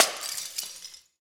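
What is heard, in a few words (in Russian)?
глухой стук разбившегося стекла вдали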